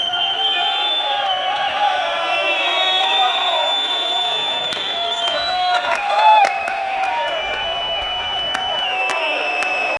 tv football on iphone, commentary

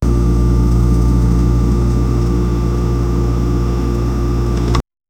opening a refrigerator, domestic

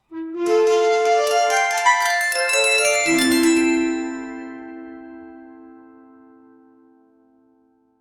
magical egyptian harp; ethnic

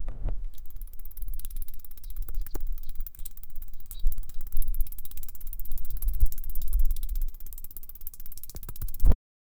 snakes, reptile

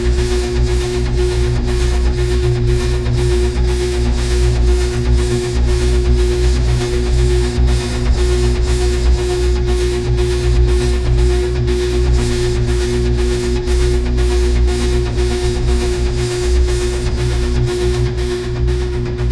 30 second cyberpunk under, club

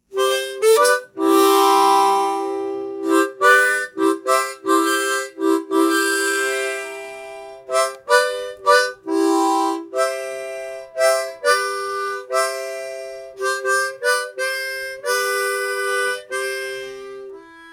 an old french tune being, harmonica; SFX Engine

